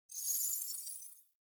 Wyld Bow Draw; Powers; Fey; Saboteur

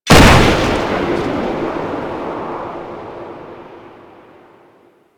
Settlement Gun Fire; Warframe; Grineer Settlement

